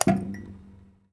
Weather Switch

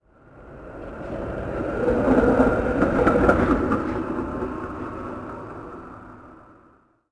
Diner Streetcar Passby; Levels Hub Diner

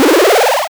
Shield, SFX

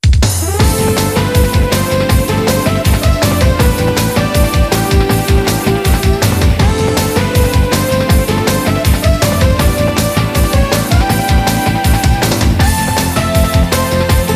Music Game General event

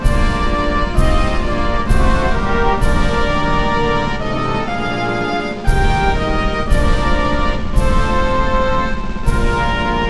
Anthem UK; J.League Spectacle Soccer 2002; Dreamcast

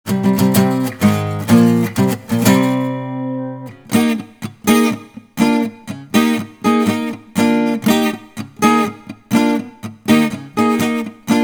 Victory Fanfare

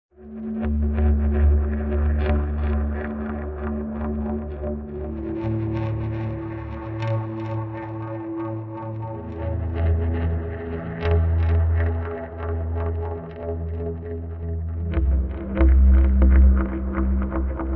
Crystal Mine Soundscape; windscape switch windows xbox one gamerip 2016

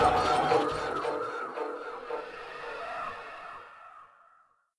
Pre Fight; Prince of Persia The Two Thrones; The Sewers Pre Fight 2

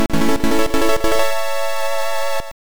Confession - Success

